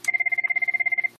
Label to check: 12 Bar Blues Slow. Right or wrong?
Wrong